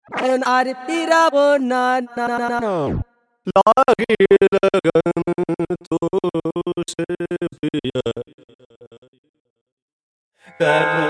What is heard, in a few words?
electronic india vocal textures